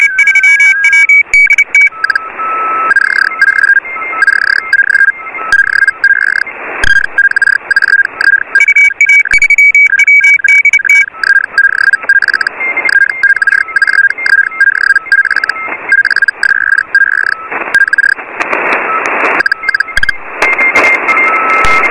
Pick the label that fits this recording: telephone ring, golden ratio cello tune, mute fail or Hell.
Hell